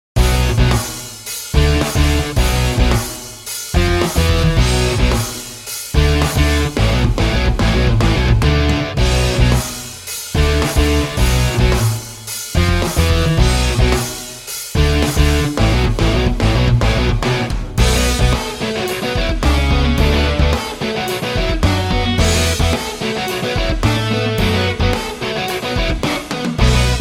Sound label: heavy metal